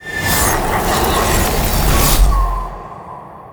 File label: skill ice reborn